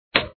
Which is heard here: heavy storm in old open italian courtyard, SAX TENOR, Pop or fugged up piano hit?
Pop